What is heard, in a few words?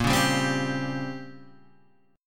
Bb Minor 6th Add 9th Bb down Guitar Open G